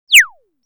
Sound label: Little Bird